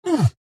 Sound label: AHurt